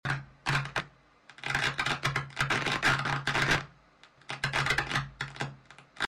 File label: Crackling